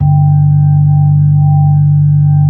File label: B-3, Brite; Keyboards of The 60's and 70's